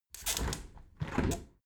window open heavy sequence

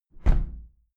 window plastic squeak close regular

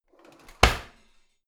old drawer in half hard

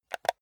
console controller home press fast

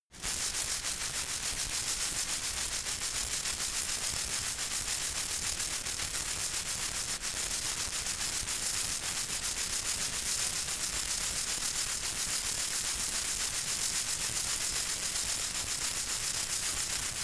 insect shaker rattle slow soft

